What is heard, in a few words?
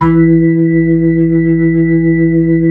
B-3, Blues